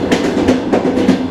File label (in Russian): stuk, train rail; Factorio; Paranoidal mod; newtrainsfx